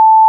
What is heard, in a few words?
popup